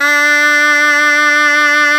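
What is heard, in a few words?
SHENAI, Shenai (Vib)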